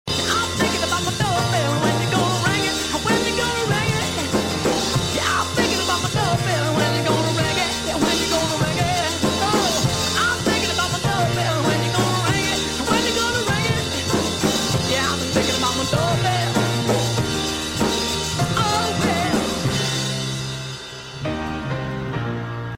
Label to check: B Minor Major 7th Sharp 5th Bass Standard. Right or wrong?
Wrong